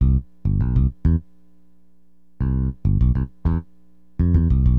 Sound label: BASS, Acid Jazz Funk